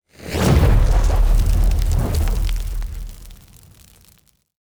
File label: Box Fire Explosion Small; TANK; 3Souls